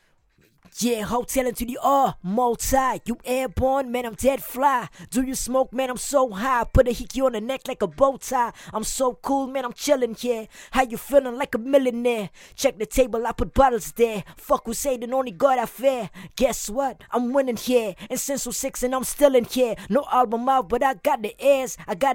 acapella, hh 65 Bm

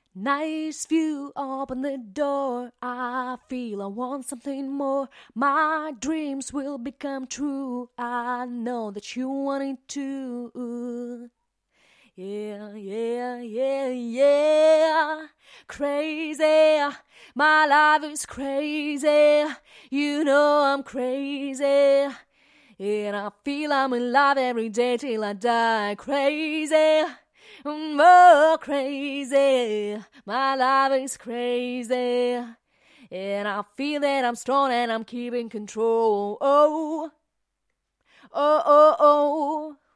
acapella, Pop 93 EM

